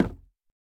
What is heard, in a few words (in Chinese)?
錾制书架 破坏声